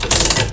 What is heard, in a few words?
coin slot